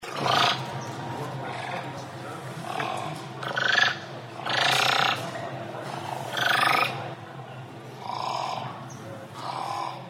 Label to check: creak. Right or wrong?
Wrong